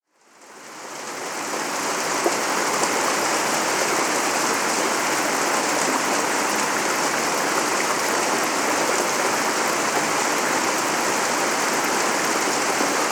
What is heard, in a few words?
Peaceful Water Ambience; Nature Sounds Worldwide